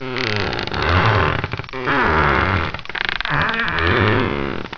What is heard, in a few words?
lawnmower